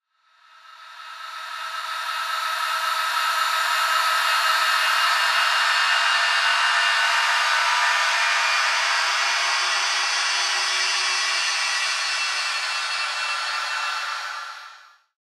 Atmospheric; 99Sounds; The Weird Side Samples

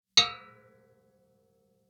ZITHER Mallet Hits CNTCT; Twisted Zither

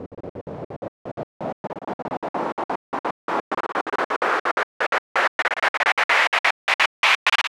Gate Sweep, White Noise

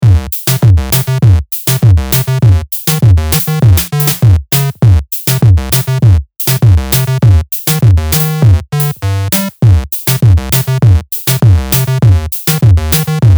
Bit Bit Loop; Unclassified Electronic

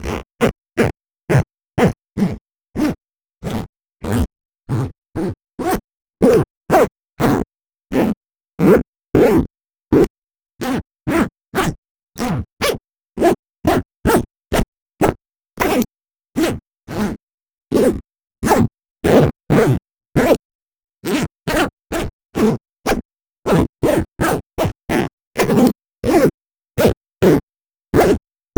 Backpack large coil zipper - short fast zips; Mindful Audio; Elements

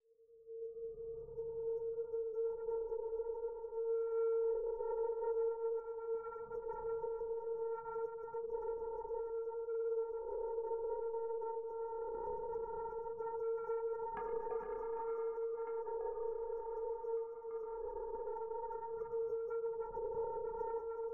Magnetic Field; 99Sounds; Magnetic Fields

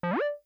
phaser up; Kenney's Sound Pack; Digital Audio

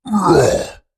attack, Orc Creature; Sounds Great; Orc Creature Library

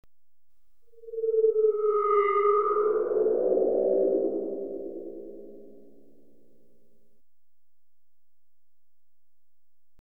Eerie Electronic Noise; BBC Sound Effects Library

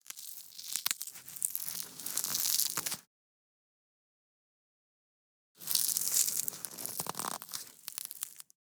RIPS AND TEARS Carpet Tear; Rips and Tears v.2

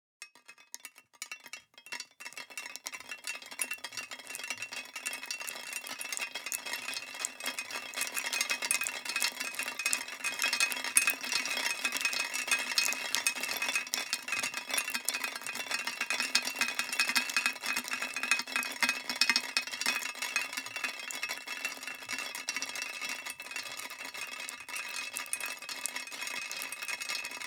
DESIGNED DRIPPY ON IRON PLATE, Rain; Rain Designer

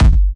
Kick, Data Mosh Hits; 99Sounds; Mangling Audio

